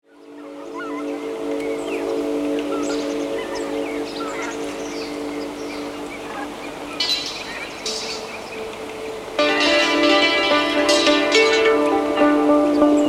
Mazurka

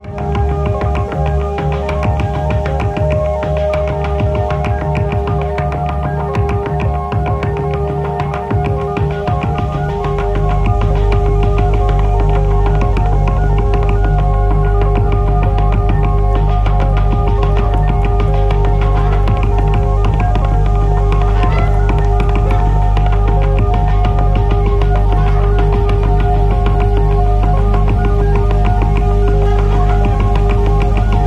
Sine Tapes

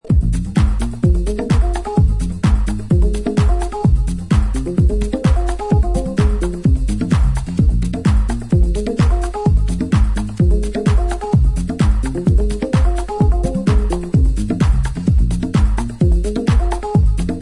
full strings instrumental mix

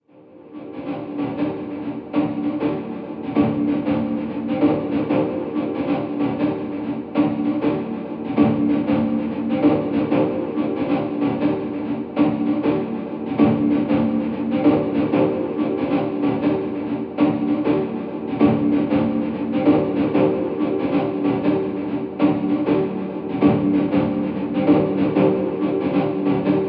Vocoder Mic Tap Loop Verb

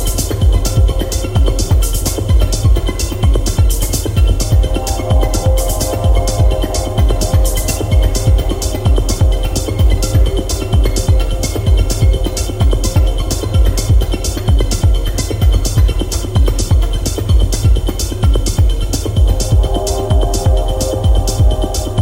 Sinus

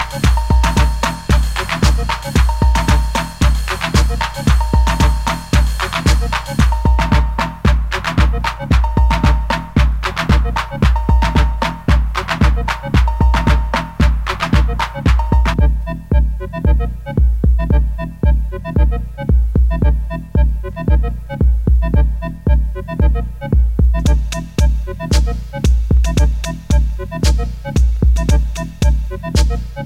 Crazy Drums; Chicago

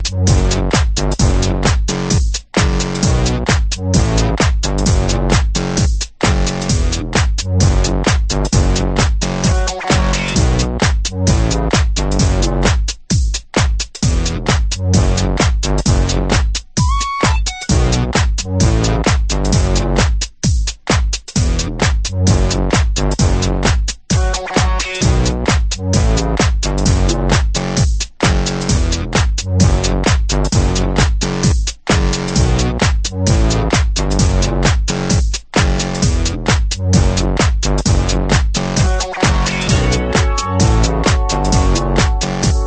remix instrumental

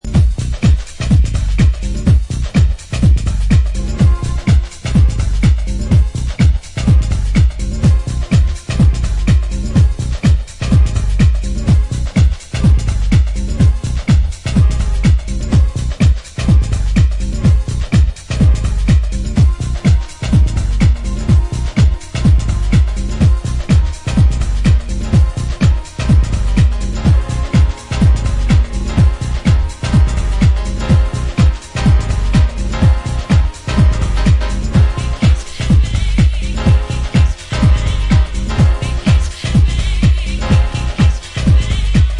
piano track

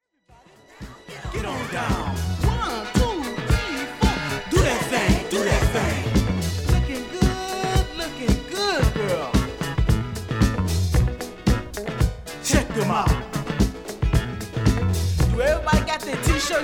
the disco scene (pt 1 vocal)

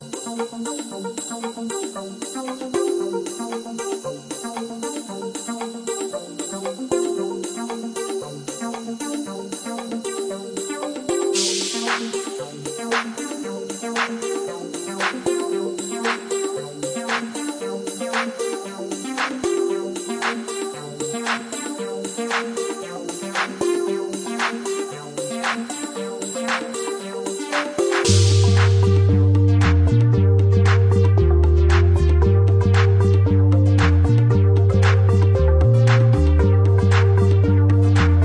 Original Instrumental

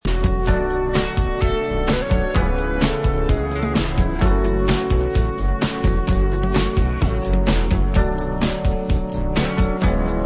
country cowboy instrumental; Disco

